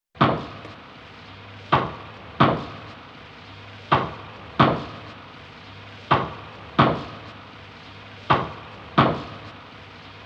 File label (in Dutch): hout hakken tweeman; ver af